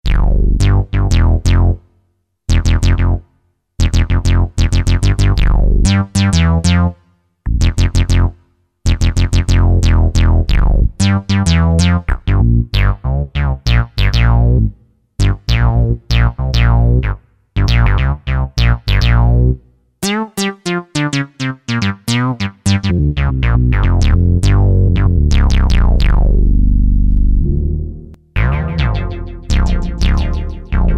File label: Funky Acid, Alesis QS8; Factory Preset examples